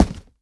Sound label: box; DoomRTX; tr new cut boxes